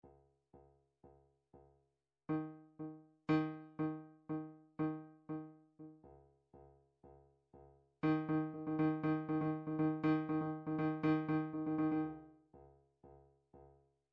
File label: drumtrax toolbox ride; Drumtrax Library 2